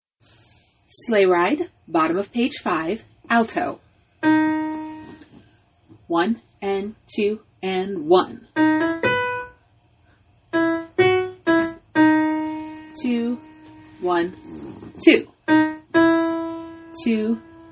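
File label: alto; study tracks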